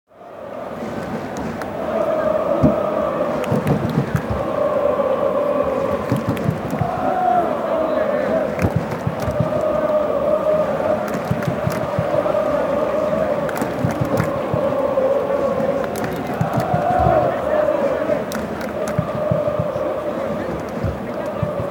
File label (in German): Stadion Atmosphaere